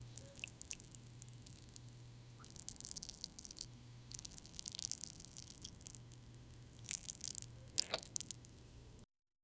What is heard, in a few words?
spreading tomato sauce on, smearing